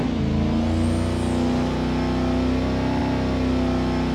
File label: third, vehicles; ambulance